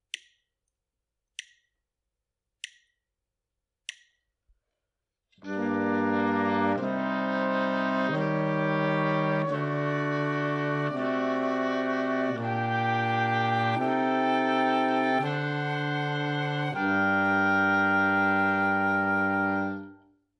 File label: harmonic dictation